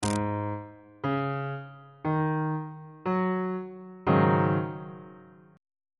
g sharp dominant 7th suspended 4th chord on bass clef